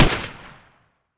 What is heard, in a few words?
sr25 fire, sniper; weapons; garrysmod